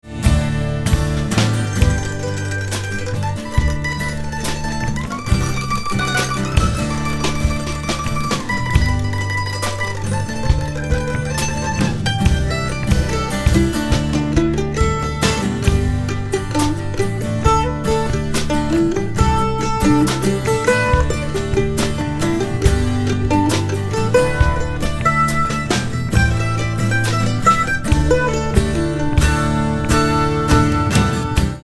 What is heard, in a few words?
Plays Mandolin